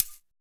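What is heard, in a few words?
scrape shaker; Drums In Your FACE!; POP-ROCK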